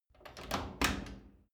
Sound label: wood door old unlock